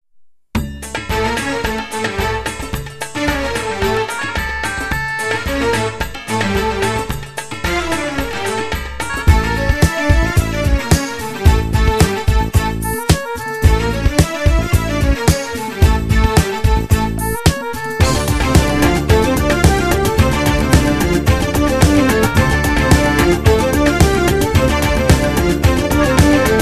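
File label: Arabian Dance, Production Music; Etink Collection; IDYLLIUM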